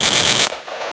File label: fire work equip sound, equipment